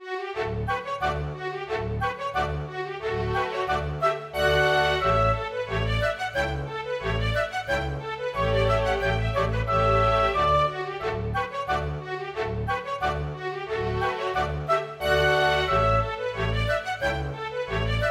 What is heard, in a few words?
Gallopade; Ursin; storgårdsmusikk